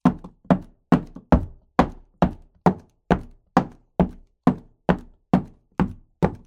Footsteps Female - Stilleto Heels Wood Floor Brisk